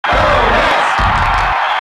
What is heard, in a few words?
Cheer International; Ness; SSB